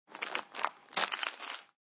Handling Plastic Food Package, Plastic food wrapping or package such as chips or cookies, Crinkling; Plastic food wrapping or package such as chips or cookies crinkle sounds.